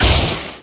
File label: projectile impact; dota; morphling